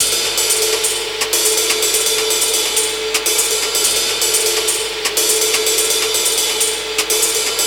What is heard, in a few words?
125 Classic Breaks, 125; Loops; Touch Loops; ClassicBreakSessions